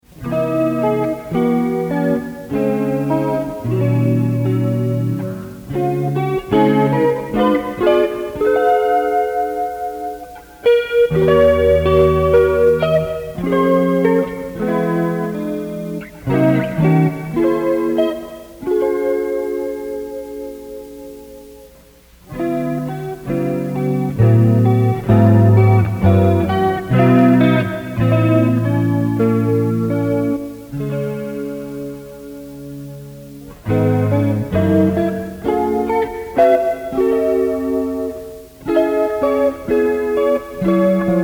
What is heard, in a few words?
Chord Melody, Solo; Kensington Farmer's Market, May 15, 2022